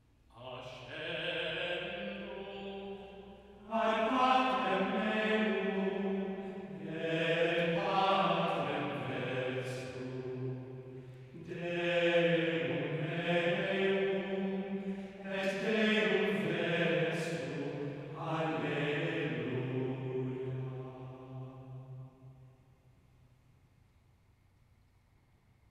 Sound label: Antiphon Ascendo Ad Patrem